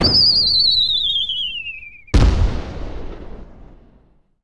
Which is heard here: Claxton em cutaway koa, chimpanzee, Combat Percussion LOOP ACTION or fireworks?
fireworks